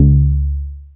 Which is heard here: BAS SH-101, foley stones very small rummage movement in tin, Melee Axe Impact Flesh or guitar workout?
BAS SH-101